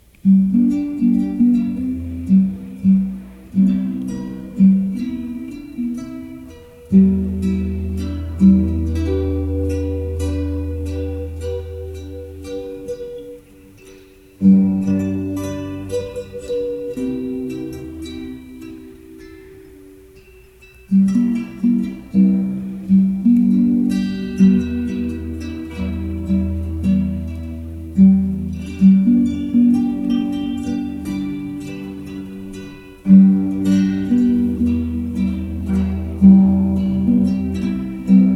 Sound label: cheerful upbeat acoustic, folk